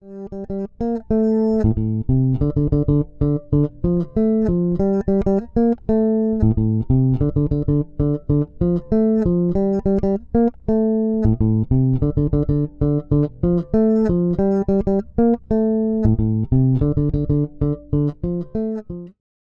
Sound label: Bridge Pickup; Lakeland 55 94 USA Deluxe